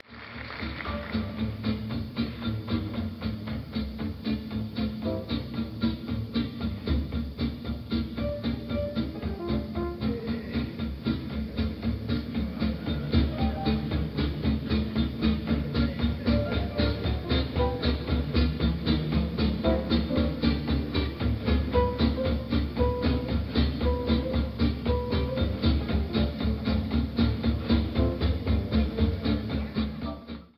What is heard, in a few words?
Jam Session Rhythm Section